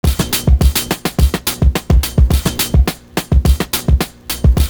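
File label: BUZZ X, 106 bpm; Univers Sons - Kitch 70s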